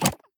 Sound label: e imp seal